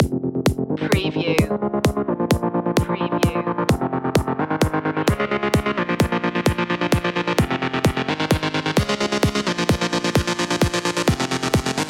sandy shoes loop

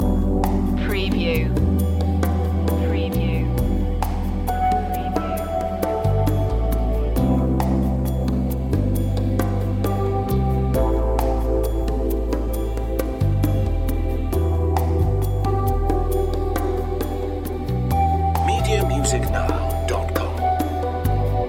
cafe vibes loop

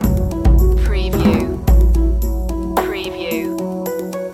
river adventure loop

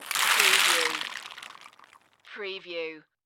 Nut Pile Move Nuts; sound-effects-bible

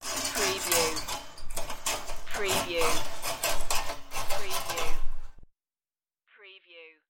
Fireplace Curtain

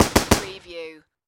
Machine Gun M240B

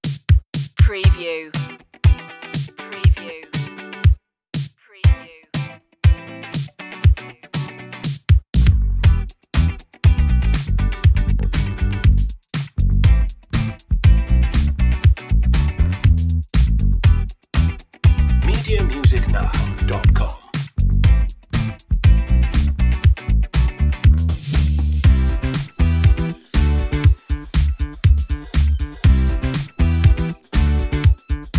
french disco

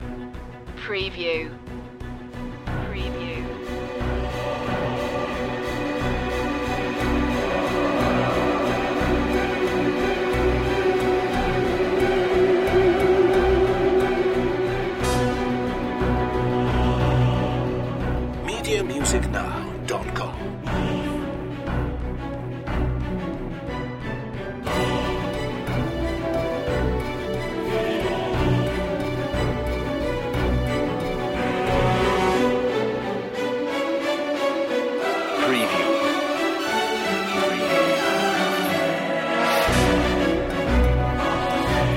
no perc

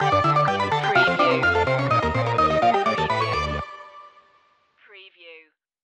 8-bit instincts stinger

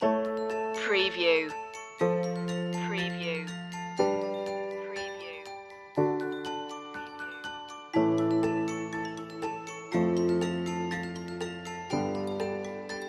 bell shoppe

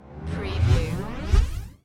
Scifi Production Element - Gyrotation

